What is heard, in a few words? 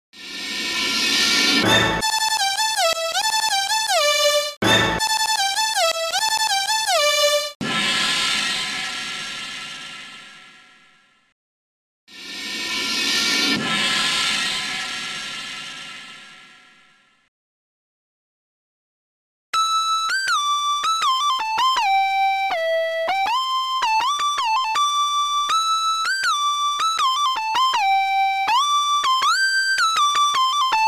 msx pcm